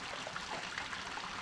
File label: Water Flow